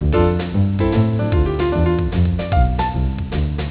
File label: jazz